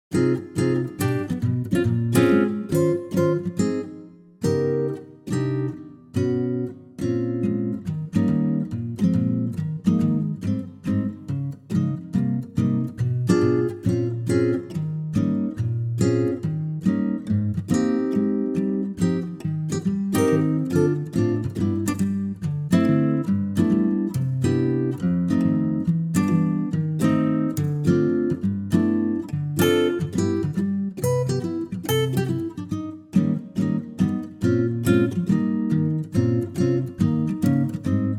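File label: Ab acoustic guitar; key - Ab - vocal range - Eb to F; stunning acoustic guitar arrangement of the jazz and swing classic standard, at a lightly swingi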